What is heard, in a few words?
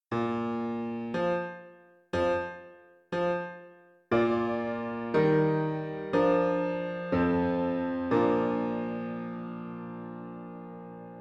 Piano